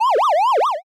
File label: spin